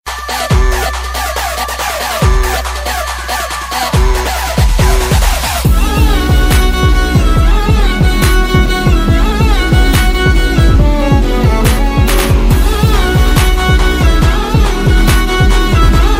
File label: Love Bgm Ringtone; A1 Express; Telugu